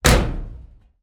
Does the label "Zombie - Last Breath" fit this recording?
No